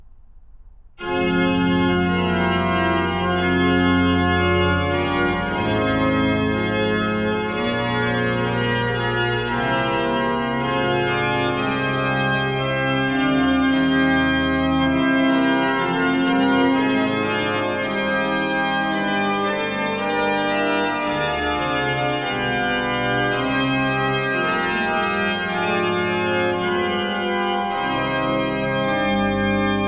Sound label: Livre d orgue, Fugue grave; II, Ton 1 IV.